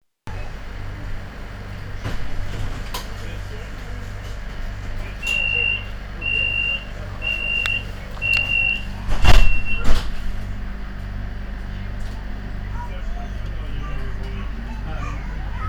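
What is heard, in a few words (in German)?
Strassenbahntueren schliessen mit Piepston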